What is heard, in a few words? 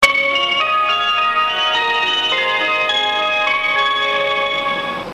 kominato bus, chime; 小湊バス; 千葉駅と千葉みなと駅周辺を巡回する『千葉みなとループバス』のチャイムです。“次は千葉みなと駅”の放送で流れます。